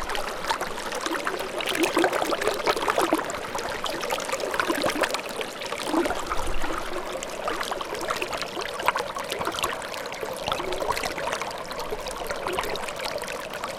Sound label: River, Static Microphone